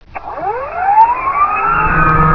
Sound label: robo startup; robolaser